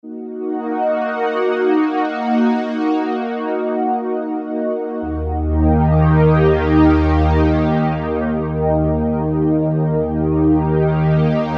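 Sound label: Analogue Strings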